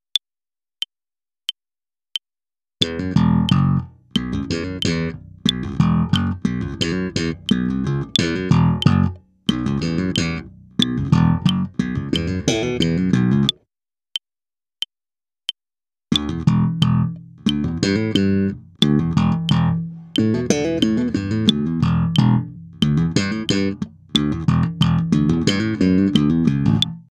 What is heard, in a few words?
Slap, bassoelettrico